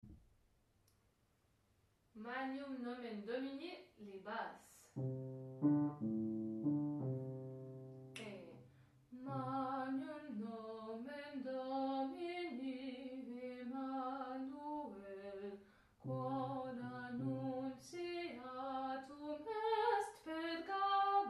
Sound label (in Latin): magnum nomen domini basses